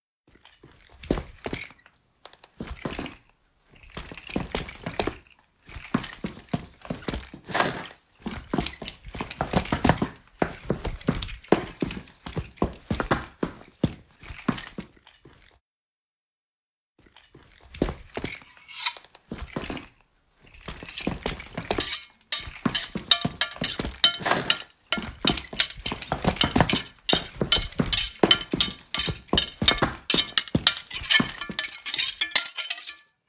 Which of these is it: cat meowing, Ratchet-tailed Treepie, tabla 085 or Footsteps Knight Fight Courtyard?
Footsteps Knight Fight Courtyard